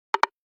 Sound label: warning, Nokia 808 PureView